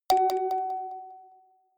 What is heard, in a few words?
notification kalimba delay high